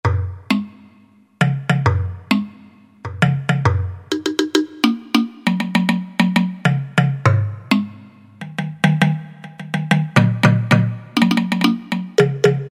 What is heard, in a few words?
LOG DRUM, Roland SN-U110-02 Latin And F.X. Percussions